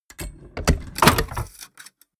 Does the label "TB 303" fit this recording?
No